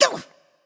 ouch; cupid

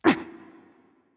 hitreact human male small; smite; npc; roman archer

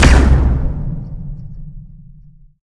pwr fire; smite; Anhur

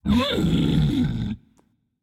tongue, voicepack; smite; jorm

